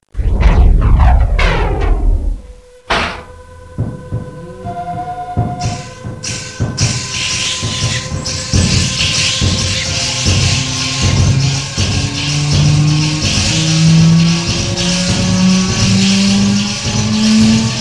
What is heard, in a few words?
metrosound